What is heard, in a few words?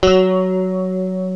guitar